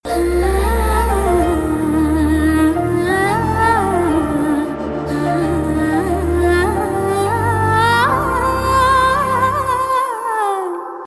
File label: song humm